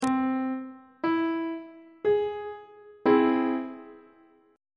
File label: c augmented chord on treble clef